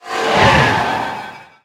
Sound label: fight start; Racoon chase